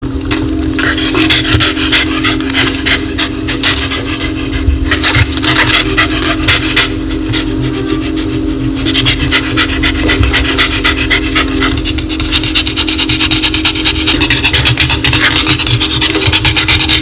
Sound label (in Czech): Psi smich